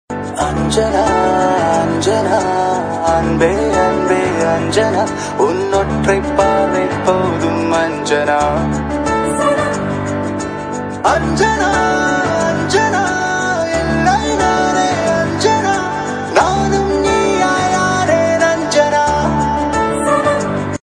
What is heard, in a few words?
hahahaa